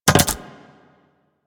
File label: Animal Lure Enable Mech; Venus